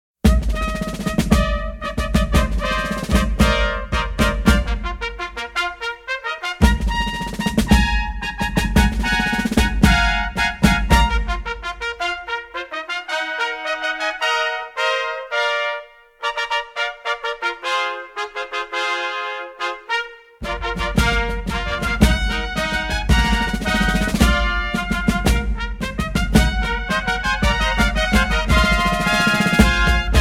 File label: Fife and Drum Corps Fanfare; Last Dream World Unknown; The United States Army Old Guard Fife and Drum Corps; ERAFNAF Fanfare